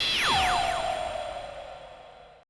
AMBI FX

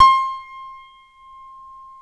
9FT GRAND; OMI - Universe of Sounds

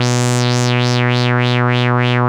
WAH WAH B2; Analogon; WAH-WAH I

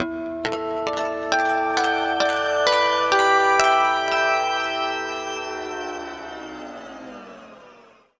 harmonic, guitar; East West; Steve Stevens Guitar Samples Collection

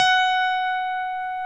ELEC GRAND; OMI - Universe of Sounds

